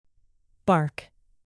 bark dog

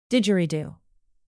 didgeridoo

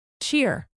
cheer

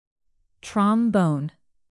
trombone

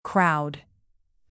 crowd

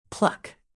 pluck

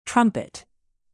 trumpet

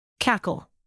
cackle hyenas

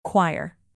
choir

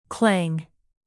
clang

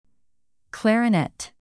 clarinet

